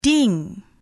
ding

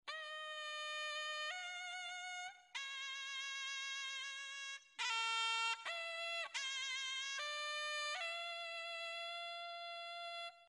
thailand bgm

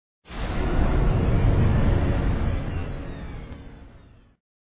stream sound

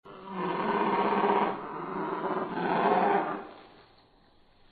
dinosaur sfx

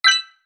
space coin win notification

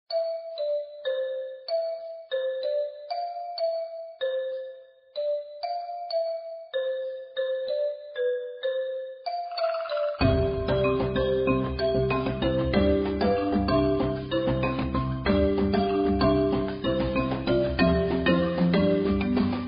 menu bgm

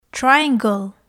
triangle

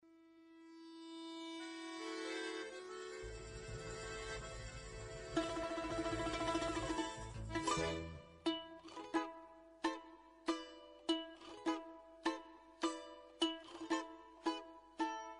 russian folk music

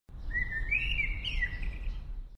birds chirping

